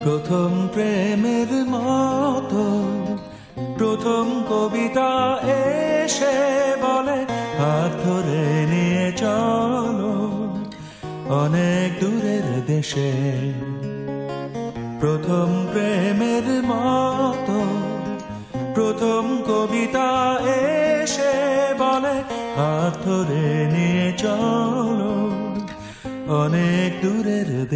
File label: Unplugged, Bangla